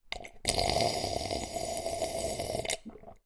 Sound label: human long slurp from cup with gulps